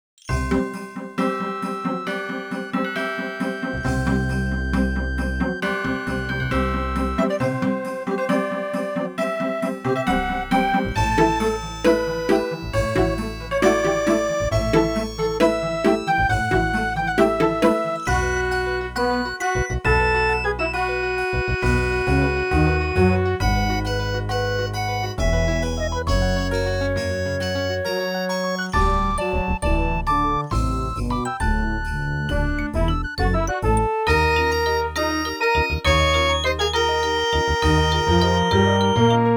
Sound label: new years fireworks music